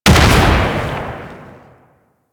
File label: Object Radial Explosion; Warframe; Warframes; Harlequin